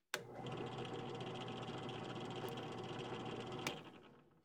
Drill Press, On, Run, Wabbly Bit; UberDuo; The Workshop Audio Playset

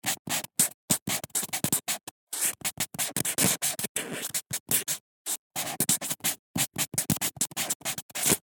Handwriting Felt Pen Kids; SoundBits; Handwriting Sound Effects

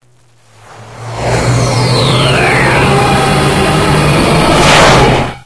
vort health charge; hl2

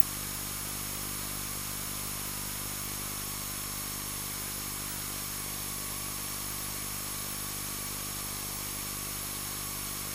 Hum, feedback loop; Glitchedtones; Feedback Loop FX & Atmospheres

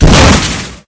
door hit; hl2

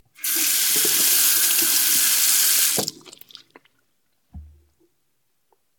Sink, Bathroom, Water, Run, Rinse Toothbrush; UberDuo; The Bathroom Audio Playset

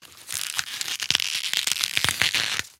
Tear and Break, Vegetable Massacres; Mechanical Wave

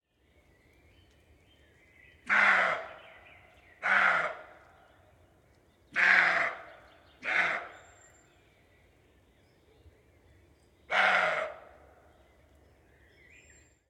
Roe deer barking parabolic; Ivo Vicic; Red Deer and Roe Deer - Roaring, barking and other sounds; GDC 2018 - Game Audio Bundle

